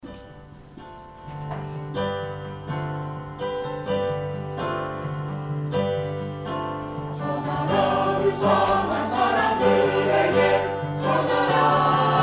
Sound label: Choir; EdenChoir